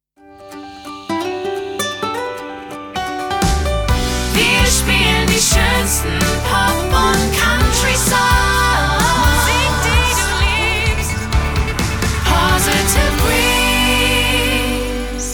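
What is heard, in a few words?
Sung Jingle, 129bpm G major, Slogan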